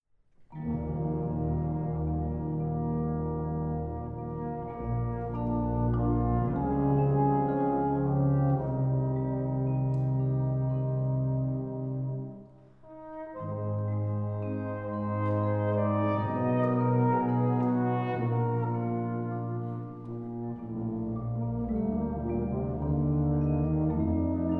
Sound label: Brass Band